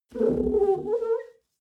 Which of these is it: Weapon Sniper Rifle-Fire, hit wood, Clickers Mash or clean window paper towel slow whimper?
clean window paper towel slow whimper